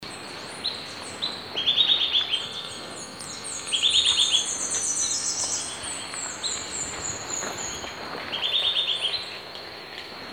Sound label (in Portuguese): canto do dancador do tepui